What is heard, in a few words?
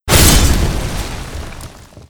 weapon; artorias